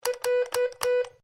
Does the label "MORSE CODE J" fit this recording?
Yes